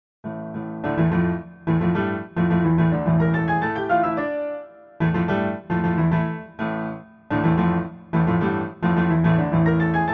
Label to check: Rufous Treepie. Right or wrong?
Wrong